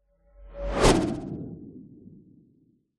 science fiction whoosh element pass by machine vehicle ultra fast